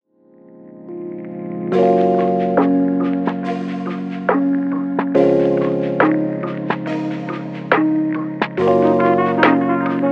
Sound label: chill meditation